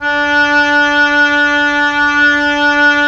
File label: Oboe f; Roland - LA Composer vol. 4